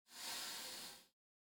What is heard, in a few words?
BUS HYDRAULIC DOOR; SIMUZENSOUND